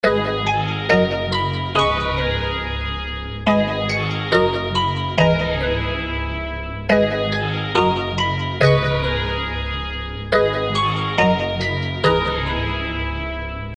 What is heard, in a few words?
Melody Loop